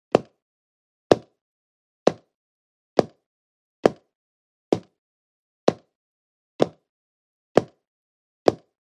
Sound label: Footsteps